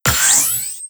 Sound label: charge drop, thumper